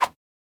Spawnin Whoosh; boomerang fu

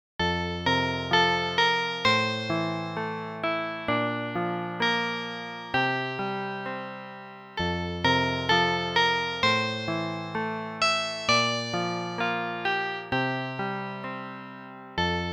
Melancholy, FM; Etrian Odyssey II Untold: The Knight of the Fafnir

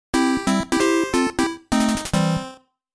Stage Fail; Game Sound Museum Famicom; Ice Climber

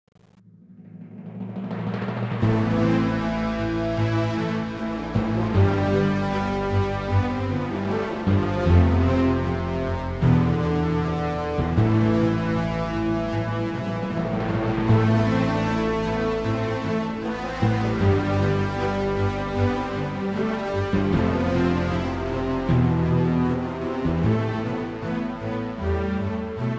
Background Music

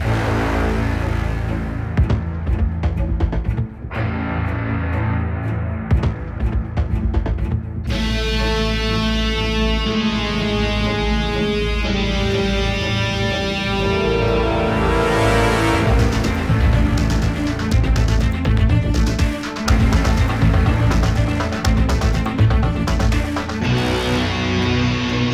Low Combo; Military Base

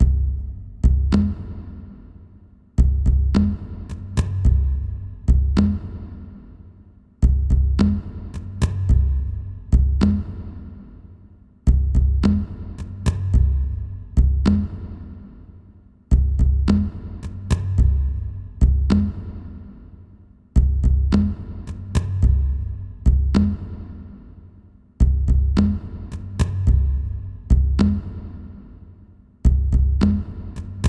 Rhythm; Jungle; Far Cry

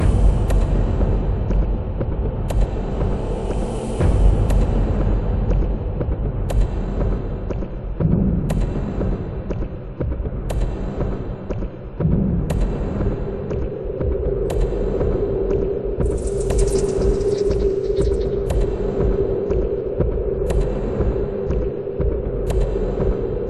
Tension ambient; Judge Dredd: Dredd vs. Death

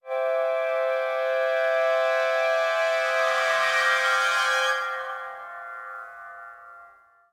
Fall 2 FLOURISH; bolt

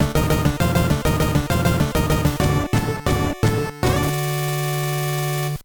All Clear; super c; nes

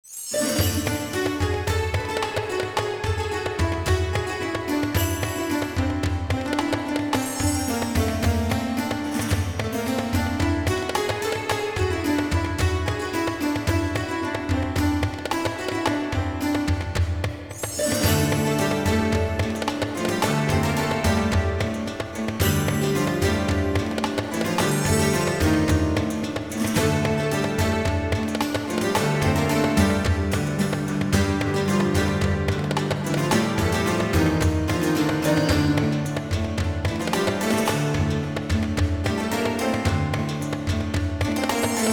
Moorish Arabian horse; anno 1503 gamerip

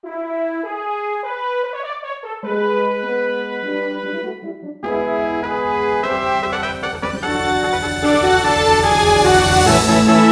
Stadium Complete

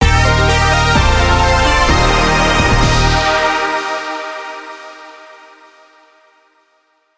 Good Fanfare; bejeweled twist 2008 pc gamerip

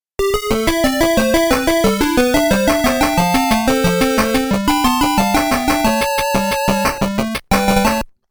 Game Over (Original SMS Version)

